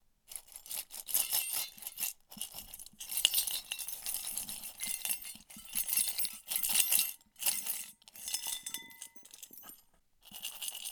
Metal Objects In Bowl

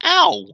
Short Oww

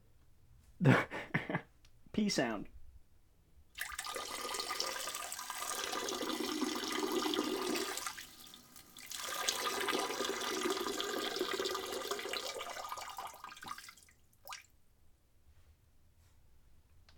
Pee In Toilet

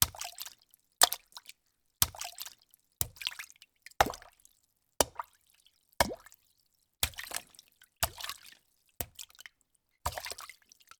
Water snaps

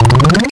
Powerup

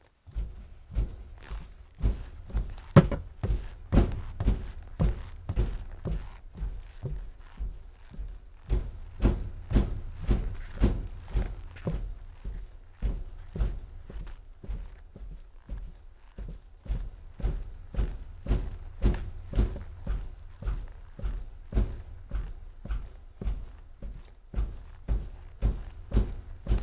Noisy Neighbors Foley

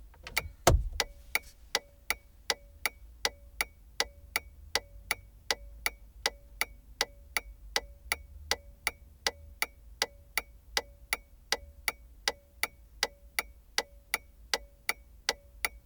Indicators; Mkh30 40

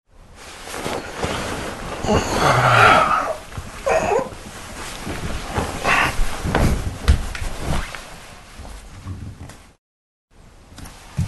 Guy Wake Up At Morning

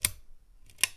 Light Switch

